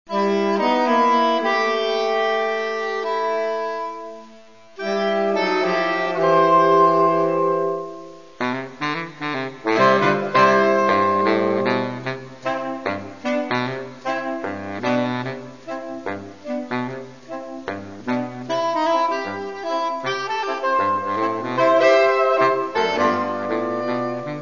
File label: sax